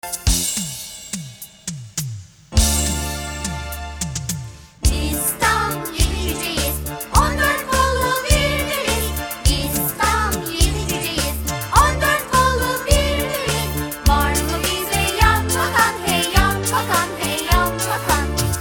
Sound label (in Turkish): Çocuk Şarkıları; Yedi Cüceler